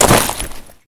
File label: jump gravel; STALKER; IWP